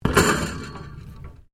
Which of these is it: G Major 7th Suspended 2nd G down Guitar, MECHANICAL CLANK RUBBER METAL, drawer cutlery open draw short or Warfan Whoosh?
MECHANICAL CLANK RUBBER METAL